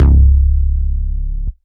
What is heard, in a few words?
Vengeance Ultimate Bass C#, Root C#